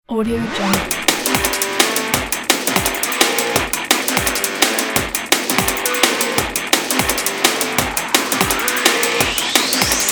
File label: extreme energetic rock